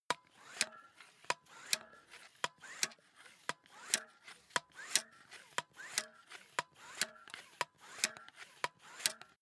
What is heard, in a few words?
air pump small sequence